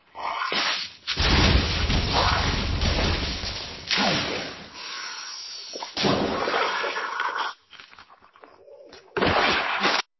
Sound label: zombies falling off a cliff